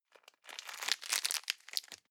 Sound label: plastic bag small pocket stick in hard